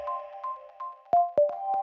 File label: Delays; Weird